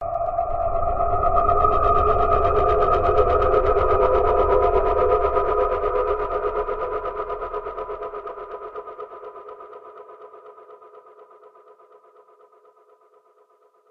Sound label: darkness humming; st mission; hs c4